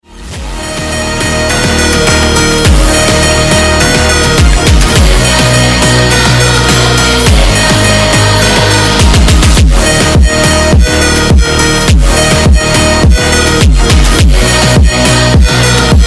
FUNK; ringtone funk; آهنگ زنگ فانک

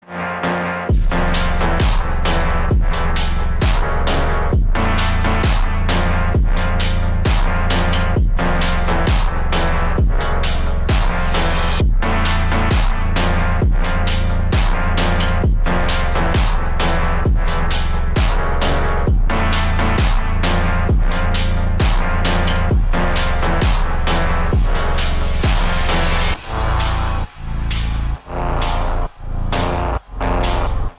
Slowed; ringtone

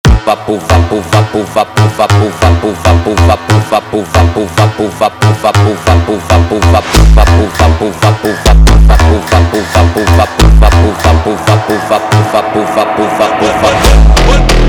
Phonk sped Up

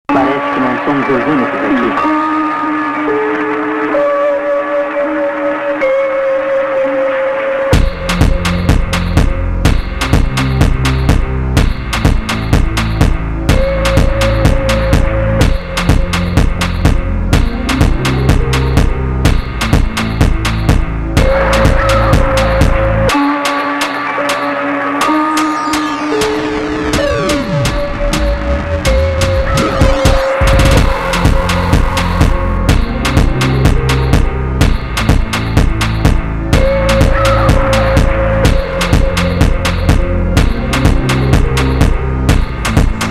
Phonk

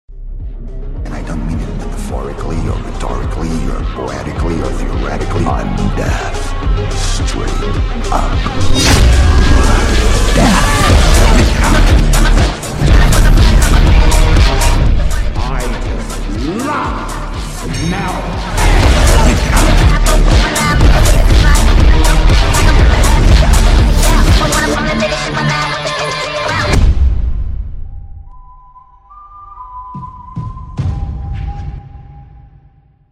Phonk; ringtone funk; آهنگ زنگ فانک

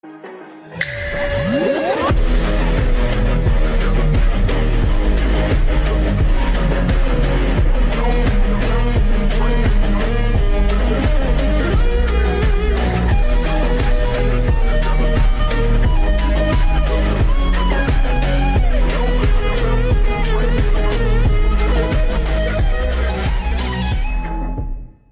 Rock Remix; ringtone funk; آهنگ زنگ فانک

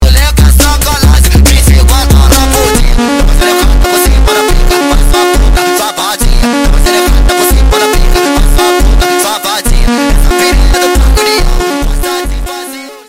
BRAZILIAN PHONK; ringtone

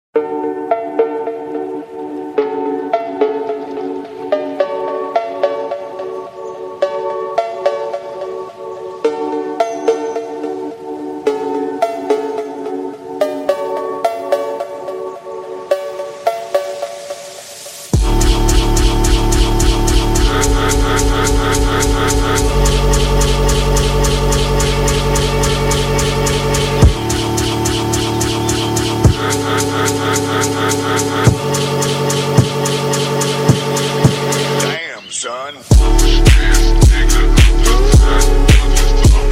Dream Space Phonk; ringtone

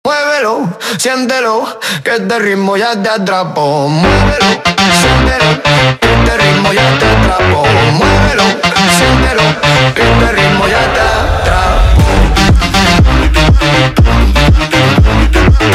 sped Up, phonk

